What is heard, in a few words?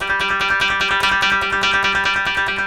KOTO TREM